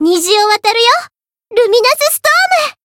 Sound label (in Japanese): Voice melody variable chant jp